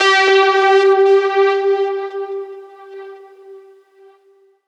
synth note stabby G; Virtual Riot

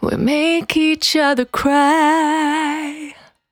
Cry 68bpm B, Vocal; Zero-G

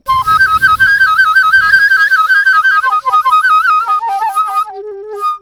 FLUTE FX

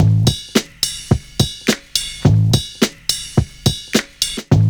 BUZZ SNAP; Univers Sons - Kitch 70s

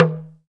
TALK DRM, Heart of Africa; Spectrasonics

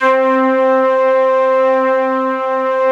ST ORG, STRINGORGAN, Yamaha VP-1

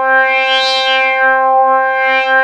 FILTER 5THS

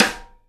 SIDE STICK, Live Snares; PlatinumPhattCD1; E-MU Producer Series Vol. 8 – Platinum Phatt

